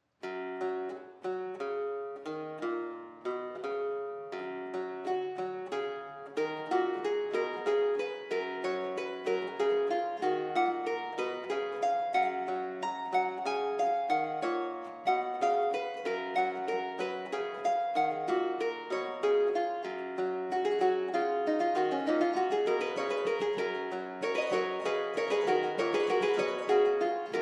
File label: ciaccona, harpsichord; madsen